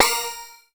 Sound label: BELL-GONG, A#; Big Fish Audio